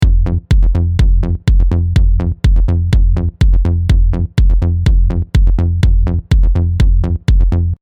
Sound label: Kick and bass no cut at fundamental